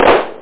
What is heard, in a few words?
clap